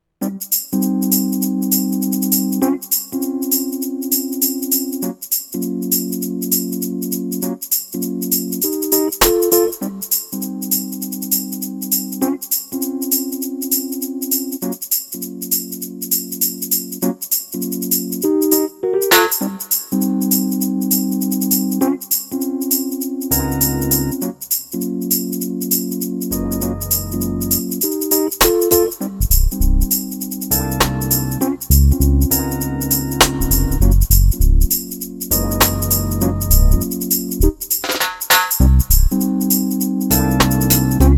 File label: Instrumental